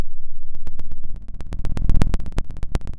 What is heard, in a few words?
pop, Sound of a fire crackling